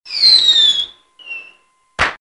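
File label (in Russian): одна петарда; Звук петард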